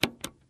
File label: Buttons